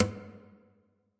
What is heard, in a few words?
Nylon Hit, Guitar Body and String Hits